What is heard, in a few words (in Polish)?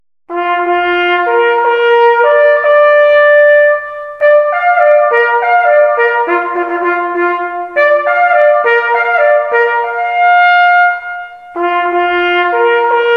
sygnaly Daniel Na Rozkladzie